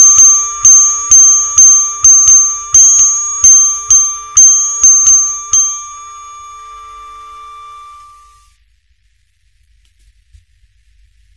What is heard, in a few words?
Ringtone